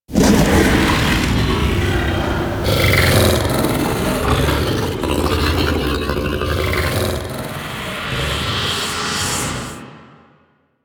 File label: Rise From Dead; Archon Death; Archon Amar; Warframe; The New War